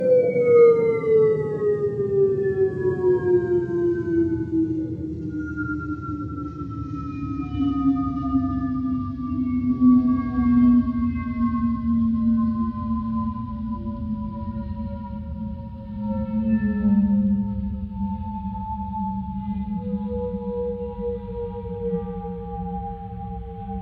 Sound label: Falling